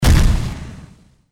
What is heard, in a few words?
explode